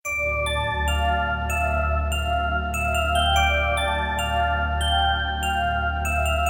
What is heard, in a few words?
Digital Bell